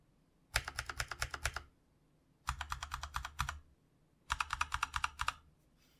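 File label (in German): Kailh BOX Brown 5x Alles; SGK50 S4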